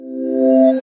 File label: Notification reverse